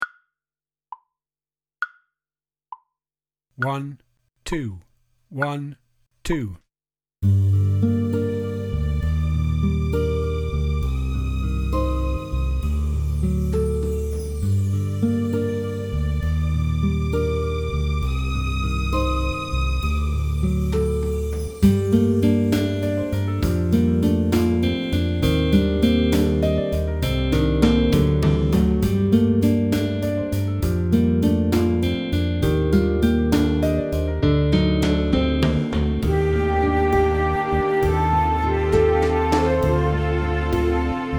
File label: Drifting Full Mix in 2, drum kit